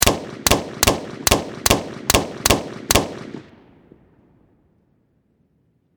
Warfare Machine Gun M16 Single Shots Rapid Fire Close Perspective; SFX Bible